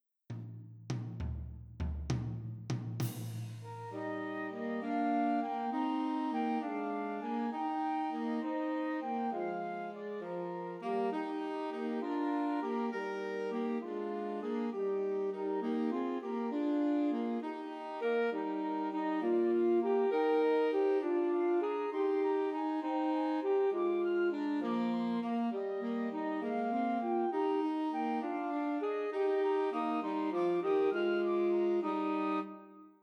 I Saw Three Ships, Christmas Trios for Flute Alto Sax and Tenor Sax